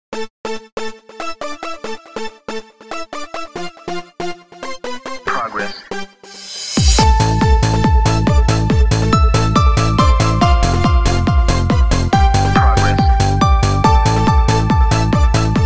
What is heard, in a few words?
Electronic; Techno